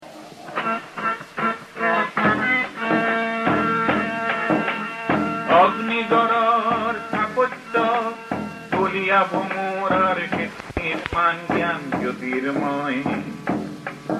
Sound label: anthem